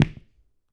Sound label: Sneaker